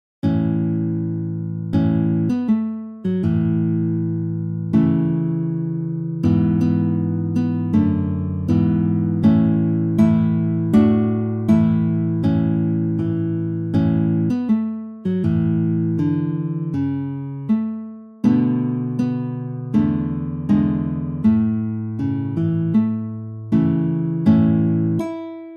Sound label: guitar; go tell it on the mountain